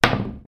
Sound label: Pool ball drop on pool table